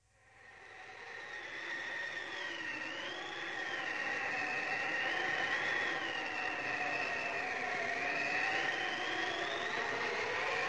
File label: cheezy storm; Zoo and Nature